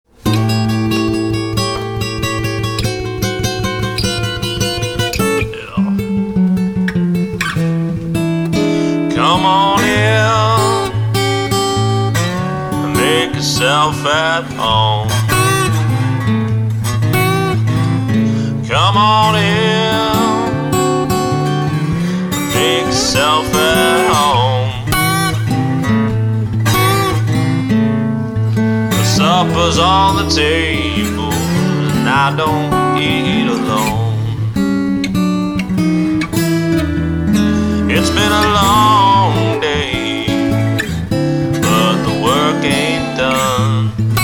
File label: Supper Time Blues